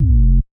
supermatter end, Grenades

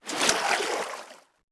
wade, Footsteps, Water

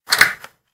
door lock on; Machines

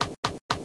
charge crab, Voices Emotes

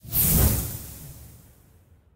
teleport arrival

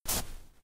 ice, footsteps

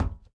plating, Footsteps

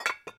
bottle clunk; civ13; civ14; Items

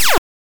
player attack, Arcade

